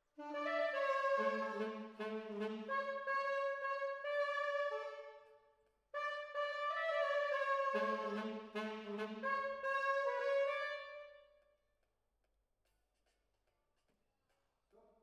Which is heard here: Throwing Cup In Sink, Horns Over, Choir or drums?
Horns Over